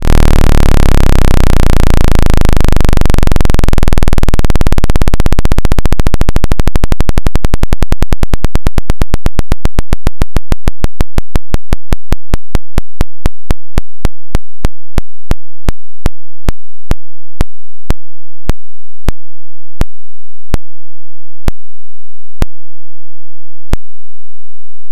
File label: Square